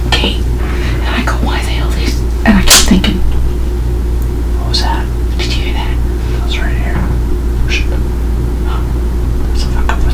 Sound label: bang 2 foot steps back room